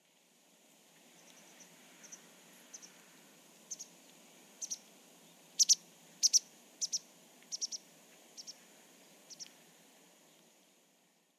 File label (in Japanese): hakuseki, nagoya, koe